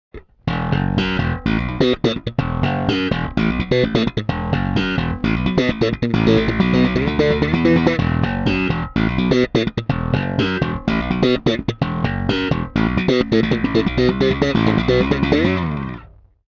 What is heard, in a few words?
Slap active; Darkglass Microtubes X7